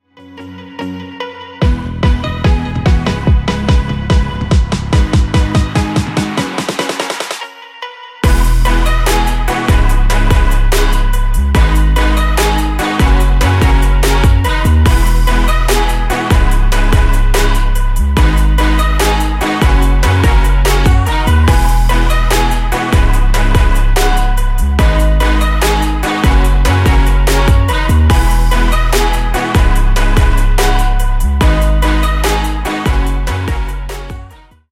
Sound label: pop sample pack; cymatics; roses